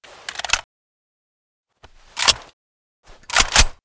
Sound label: generic reload; firearms